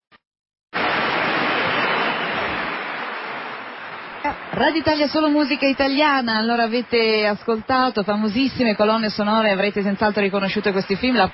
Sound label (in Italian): Applauso E Commento